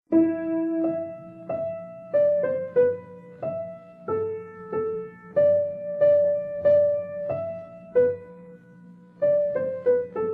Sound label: Variations on theme of Ukrainian folk song; Savytsky Roman